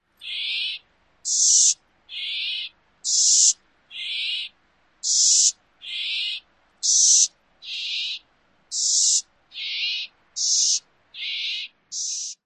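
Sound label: California Gnatcatcher calls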